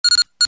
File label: shuttle beep, computer; DS9